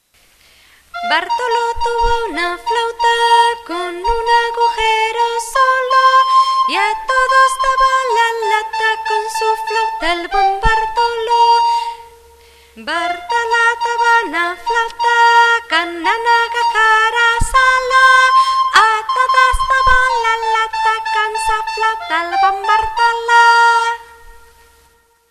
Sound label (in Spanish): cancion